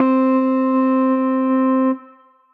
Synth Keys

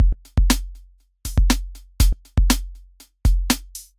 Hip Hop Rack, Drum Racks; DM-307A Free Pack by Heavyocity

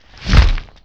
092 R&B Weird Fx; R&B Funk Soul

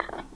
Grammophon, Household; Convolution; NI Kontakt 5

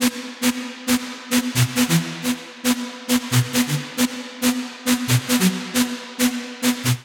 Strings E Minor 136 bpm, pads; MIDI Clips; Tonal; Orchid; Skitter and Step; Ableton Factory Packs

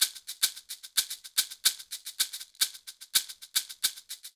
Guaguanco Maracas 220bpm; Latin Percussion; Guaguanco 1; Ableton; Factory Packs

